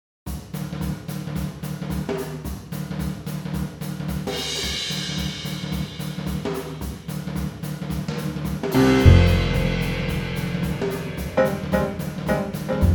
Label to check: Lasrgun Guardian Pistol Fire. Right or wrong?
Wrong